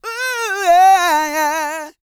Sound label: GOSPEL; Vocal Planet Vol1 Gospel